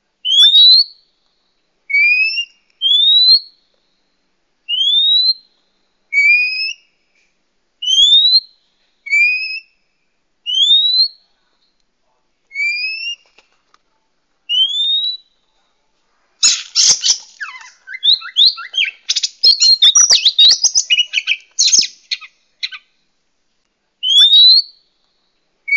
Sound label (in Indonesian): suara kacer pikat